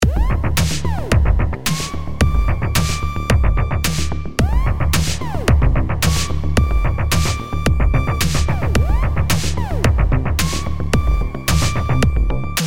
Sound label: Energize Intense; Budget Cuts 2